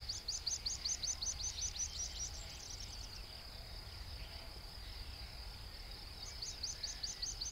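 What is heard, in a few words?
pipit double call over the meadow; pispola dvoynoy pozyiv nad lugom; zvuk schevritsyi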